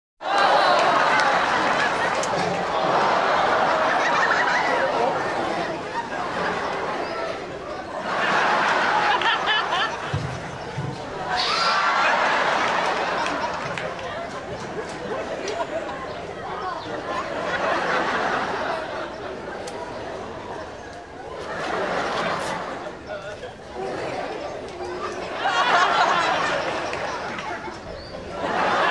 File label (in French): Reactions du public; bruitage